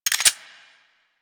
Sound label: Electro Mag Mech, Weapons; Warframe; Corpus